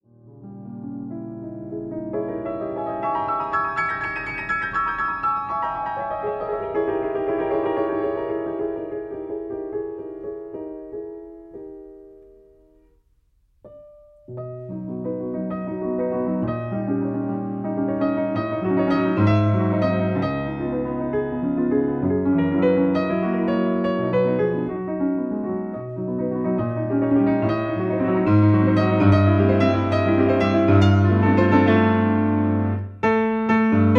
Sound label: Grand piano; P 173 Breeze; PETROF